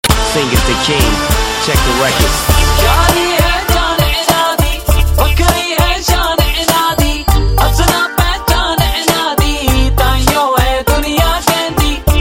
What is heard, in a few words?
Is Calling Ragtime